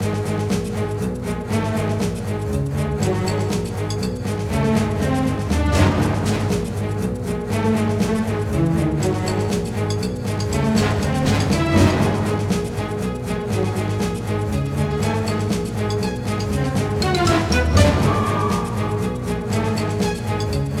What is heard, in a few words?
Boss combat; The Hobbit; PS2